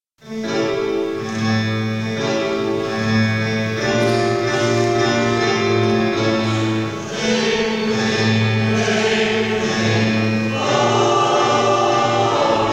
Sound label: jingle bells swing; xmas